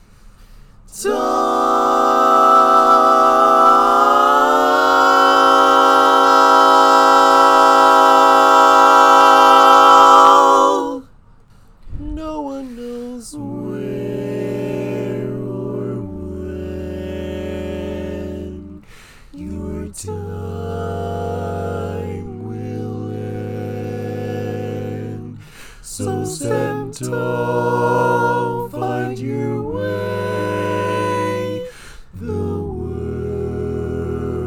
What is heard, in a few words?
All Parts, barbershop tags